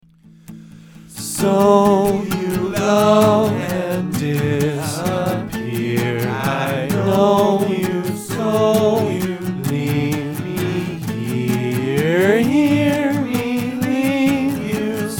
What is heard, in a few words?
drone before